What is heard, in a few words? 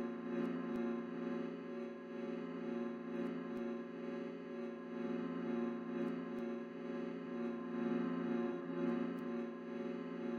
U V Light Hum; Lethal Company